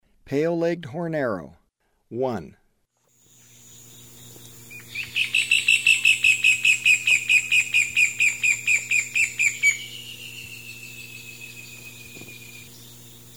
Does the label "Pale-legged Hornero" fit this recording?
Yes